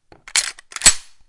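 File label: reload, guns; TDGame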